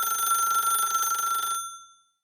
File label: telephone ring